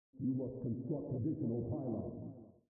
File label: pylons; Minecraft; liminal-industries; Liminal Resources